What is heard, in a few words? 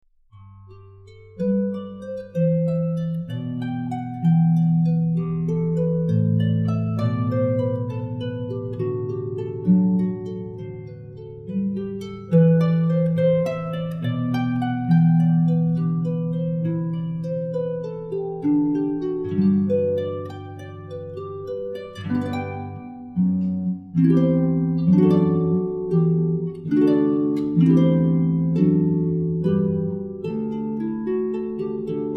Big Harp